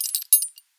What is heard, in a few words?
Belt Buckle